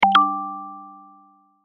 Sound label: new message